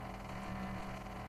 Door Loop deep; Phasmophobia

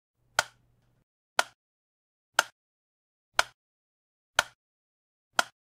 Metronome 60bpm; Phasmophobia

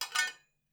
metal pipe; Phasmophobia

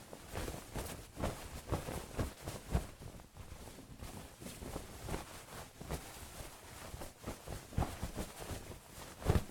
Tent door loop; Phasmophobia

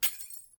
Bulb smash; Phasmophobia

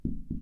Window Knocking; Phasmophobia

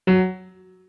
Piano Key; Phasmophobia

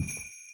foot; Phasmophobia; Santa

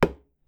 wood; Phasmophobia

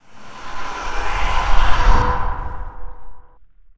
Ouija break; Phasmophobia

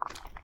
Wet footstep; Phasmophobia

